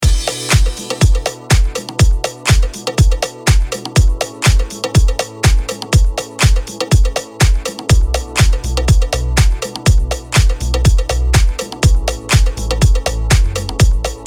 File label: 10A 122 Club House